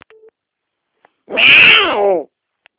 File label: mew